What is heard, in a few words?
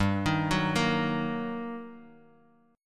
G Minor Major 7th Sharp 5th, G, down, Guitar, DADGAD